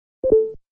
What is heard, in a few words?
notification